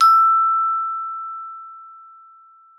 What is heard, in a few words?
GLO E4, GLOCKENSP; Xsample VOL-4